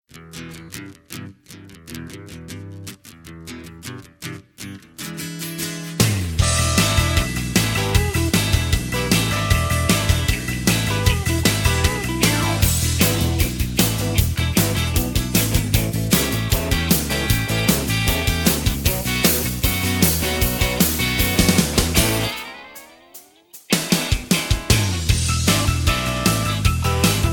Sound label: (E) No Backing Vocals